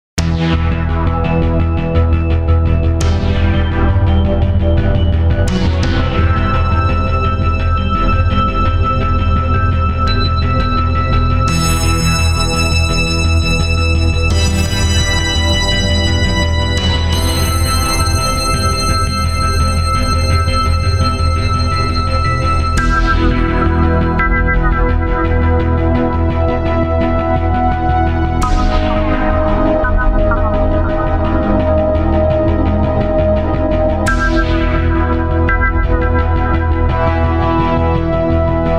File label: Atmospherics music